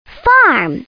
FARM

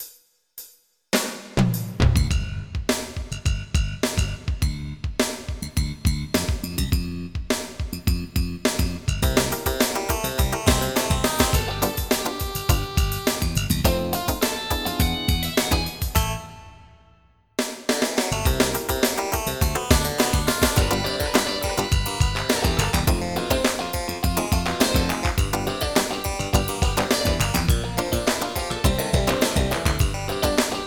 funk; MIDI Music File; ez key

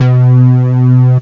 Monster synth C2, Bass Hits; Aminet

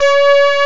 string lead

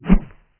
woosh; Mean Girls Press Kit

